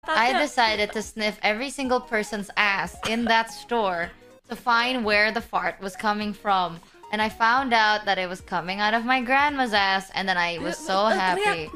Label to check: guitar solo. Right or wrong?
Wrong